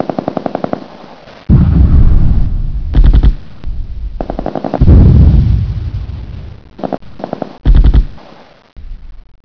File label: fight, ambience